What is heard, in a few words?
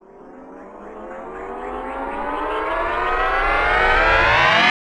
Rise FX